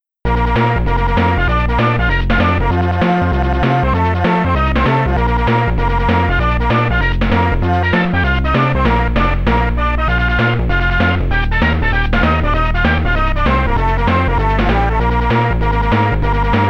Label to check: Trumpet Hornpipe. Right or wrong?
Right